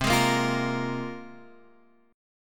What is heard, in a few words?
A sharp 7th Suspended 2nd, chord